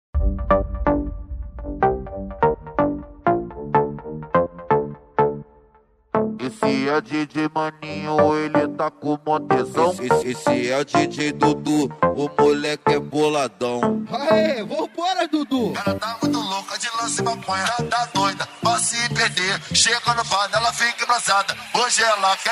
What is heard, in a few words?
mega funk; cd tony hilux pancadao 2024